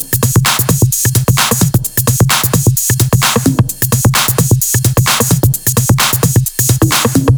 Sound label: 130BPM Kit; Pressure 1 Kit